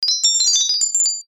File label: sparkle long